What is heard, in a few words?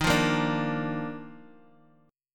Eb Major 7th Suspended 2nd Eb Guitar 4F 4A 5C 5G 5C 6E; strummed; 10,8,10,7,x,x